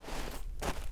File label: dirt shuffle, footsteps; chief